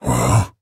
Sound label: Nonverbal Surprise; Ornn